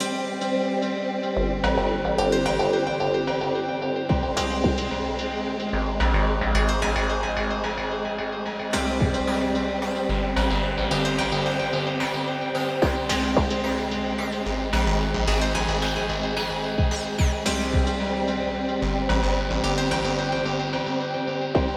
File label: instrumental